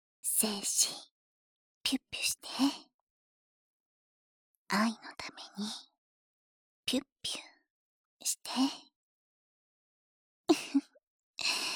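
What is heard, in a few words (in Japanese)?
ぴゅっぴゅおねだり耳舐め（右）; 左右反転差分; お屋敷メイドのず～っと4人で♪ ハーレムおねだりオナサポ♪♪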